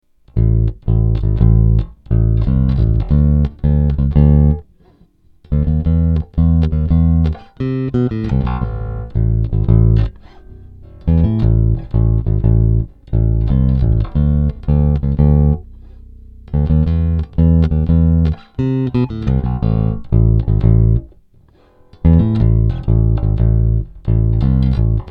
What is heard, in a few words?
bass